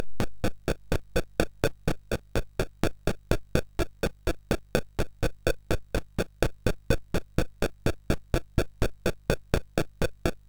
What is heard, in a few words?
MOS6581R2, GAMES; Sammy Lightfoot